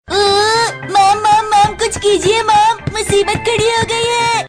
sms ringtone; shinchan

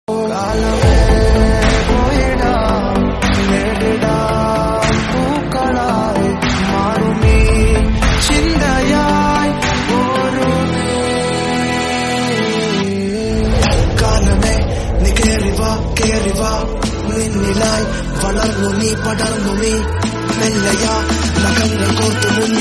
kalame poyidam ringtone

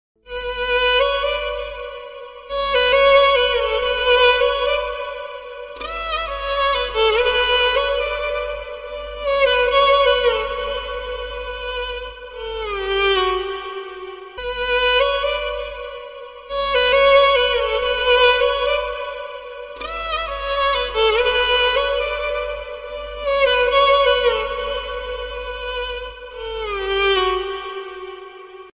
Instrumental Ringtone

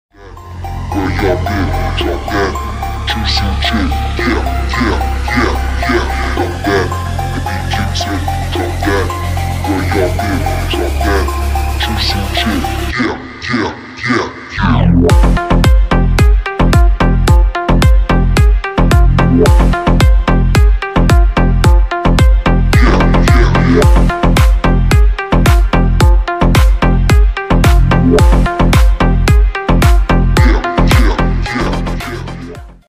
Dj Remix Ringtone Bass Boosted

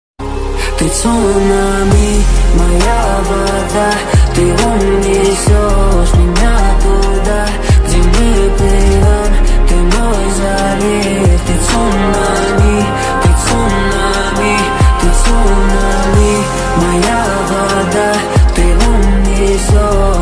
Ringtone

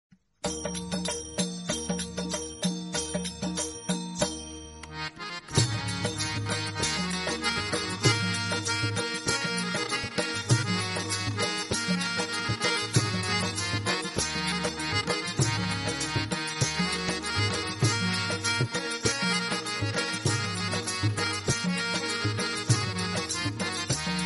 Instrumental Bgm Devotional

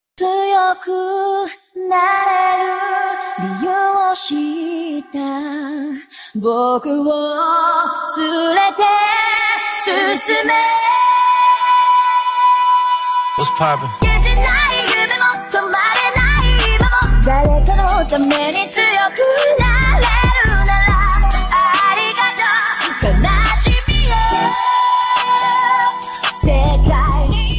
Remix Ringtone; Demon Slayer; Anime Ringtone

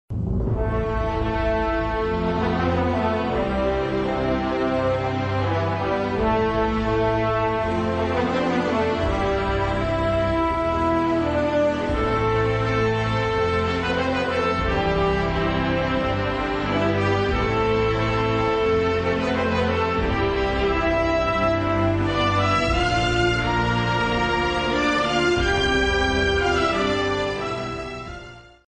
Army Instrumental Ringtone

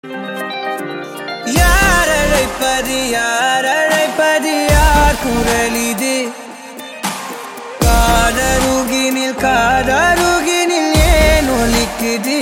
BGM Ringtone

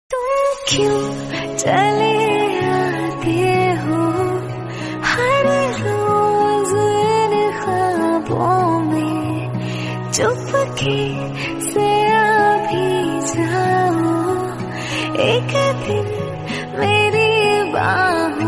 ringtone

